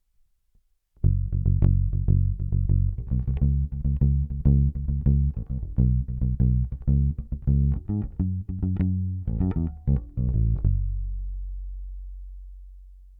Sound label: Fingerstyle EQ Compressor40; Walrus Audio Badwater